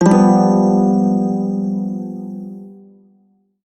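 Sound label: Shawzin Major Chord; Warframe; Prime Shawzin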